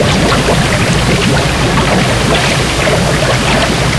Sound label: engine water rpm, engines tanks; War Thunder